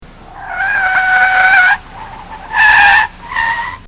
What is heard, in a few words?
Audi Braking Hard; Cars; Vehicles; Bobby Cole